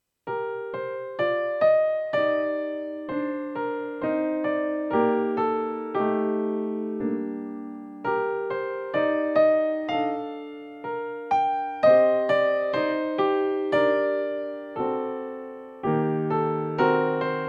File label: Russian Song, Piano; 1 page pieces book 2